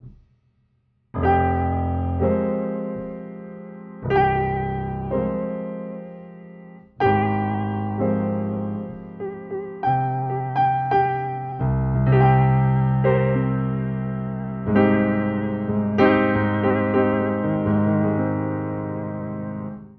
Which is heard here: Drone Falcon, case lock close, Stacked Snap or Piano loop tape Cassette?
Piano loop tape Cassette